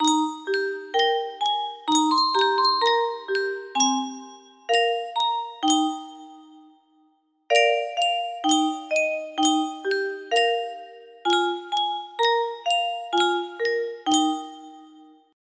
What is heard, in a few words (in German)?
Yunsheng Spieluhr, music box melody